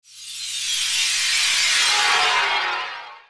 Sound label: Magic deathblow; Eft